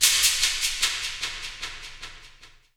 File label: Shaker; Forest